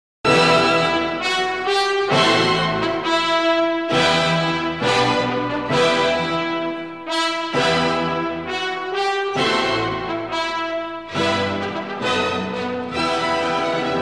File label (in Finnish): kuulokynnys